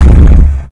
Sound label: taunt bumper car quit; tf2